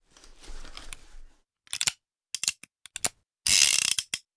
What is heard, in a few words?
draw admire, weapons